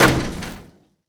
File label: Dunk